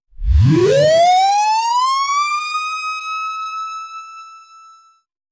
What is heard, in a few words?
crescendo synth build up